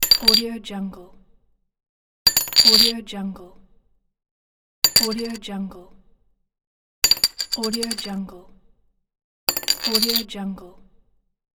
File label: bottle cap